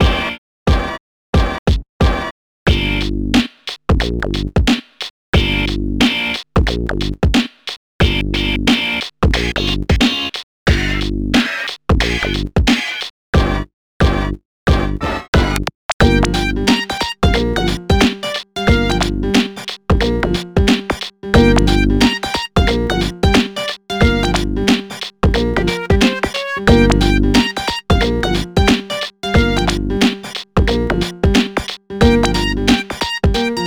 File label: Hip-Hop Stage; pinky street kira kira music night pop town gamerip